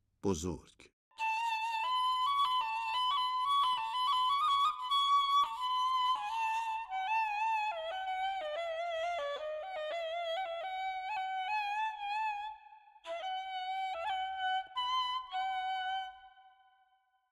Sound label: Bozorg Nava Radif-e Ney; Dastgah Nava